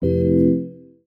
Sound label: logout; chat grid